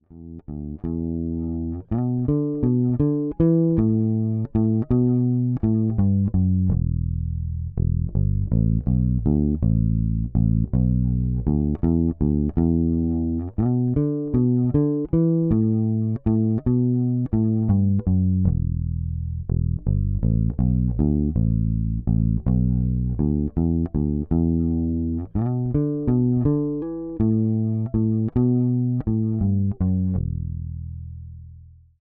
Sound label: Radial J48 Fender Precision Bass